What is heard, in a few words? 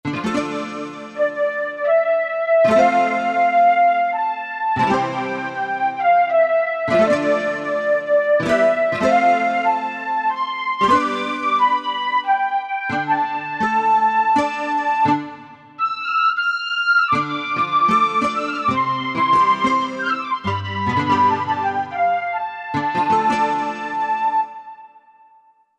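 Guitar Flute, Korg MSC-1S; Korg MSC-1S Variety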